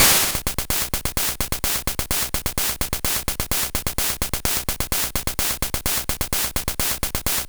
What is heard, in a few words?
Game Boy Noise Crash Hats Loop; 8-Bit; Chiptune; Nintendo; Drums